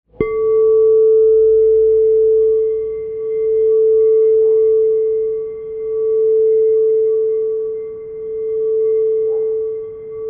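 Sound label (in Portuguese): FOSCA A4, instrumentos de quartzo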